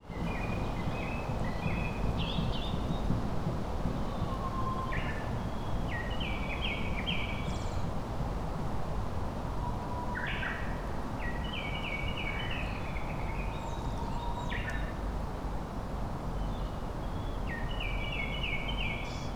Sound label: kotori tit; Many birds were singing in the beautiful fresh greenery. Frogs were also singing